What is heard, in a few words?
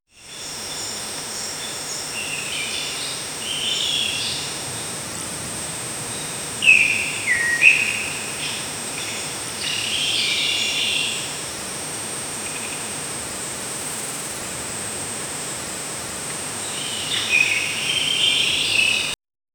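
peaceful jungle forest ambience, blowing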